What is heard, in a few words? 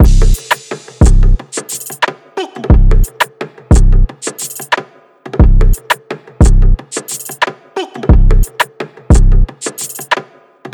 178 BPM Bm; Drama